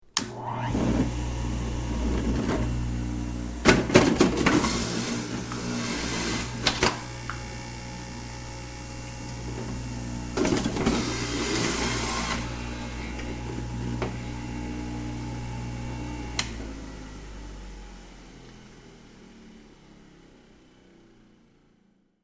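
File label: blender and juicer sounds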